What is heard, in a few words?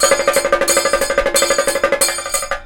KATAKA, Indian Traditions; USB Soundscan vol.45